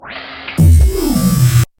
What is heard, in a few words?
synth phrase teleport